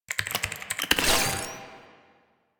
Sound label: Computer Interact; Albrecht; Warframe; Quests; Entrati Labs